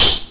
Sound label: axe metal, weapons